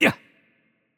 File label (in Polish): Hurt Light; VO; pl PL; bloom minigame